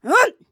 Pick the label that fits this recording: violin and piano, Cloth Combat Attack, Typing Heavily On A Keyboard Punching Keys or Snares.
Cloth Combat Attack